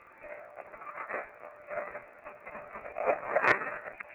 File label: robot